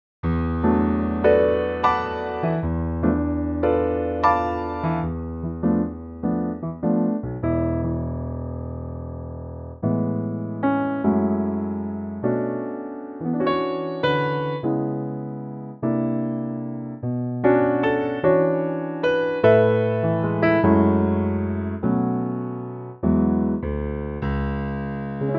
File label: A piano